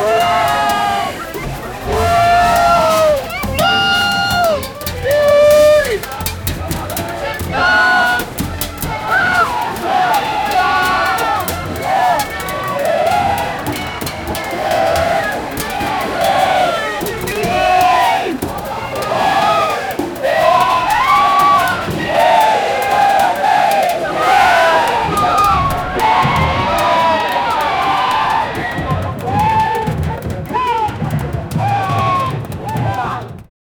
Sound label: a parade of people in